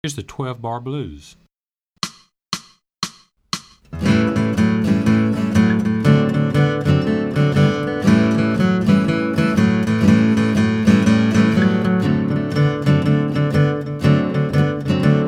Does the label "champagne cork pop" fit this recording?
No